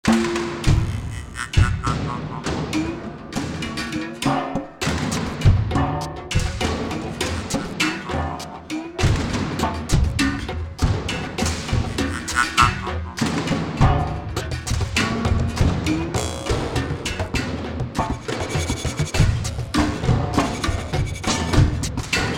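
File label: Battle Loop Rural Percussion; Fallout New Vegas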